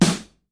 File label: snare